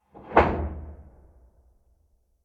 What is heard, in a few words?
Door Metal Large Closing Bolt Impact Stinger Low Pitched; Sound Design; Stinger Sound Effects